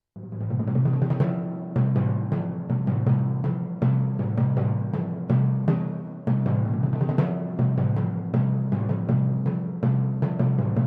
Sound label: timpani